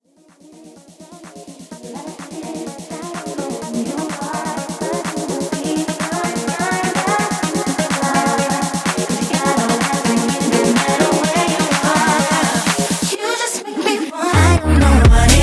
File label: Tech House Intro; Remixfreakz